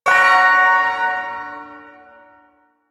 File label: Ersatz Shawzin Yo Chord; Warframe; Duviri Ersatz Shawzin